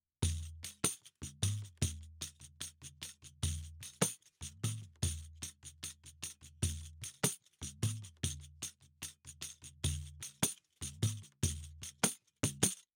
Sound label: Pandeiro Mid; Yasu Mix